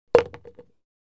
Golf ball in the hole

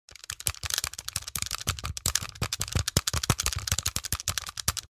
Gamepad pressing buttons hard

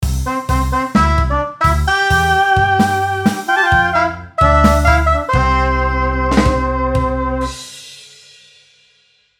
Sitcom theme music intro outro

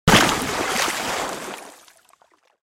Diving into water with a splash indoor pool

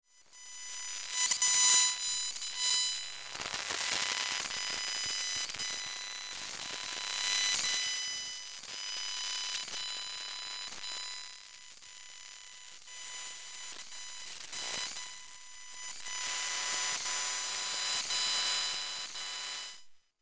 Electromagnetic buzzing emf interference

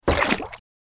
Hollow metal object throw into bathtub; Liquid

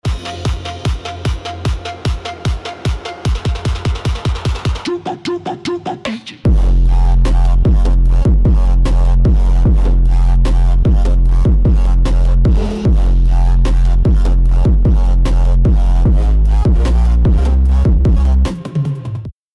Heavy bass rumble bassline; bass boosted

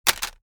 Pile of CD and DVD cases dropping a disc

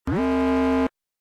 Sci fi alarm strong dramatic single alert

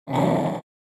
Goblin emotion bad aggressive

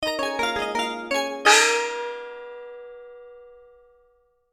Chinese transition cartoon asian inspired

